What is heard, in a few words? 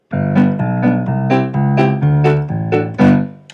G B C E D A G bass and chords, simple piano recordings